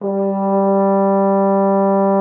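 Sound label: French horn G3; Xsample VOL-3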